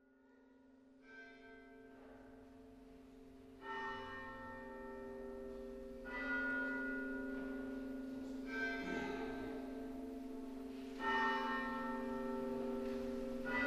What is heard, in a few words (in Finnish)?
Kutsukellot